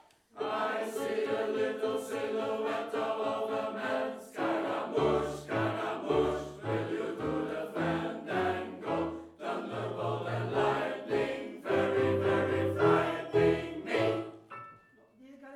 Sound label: Bohemian SATB